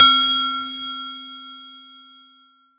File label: Roland JV-2080 Glockenspiel C4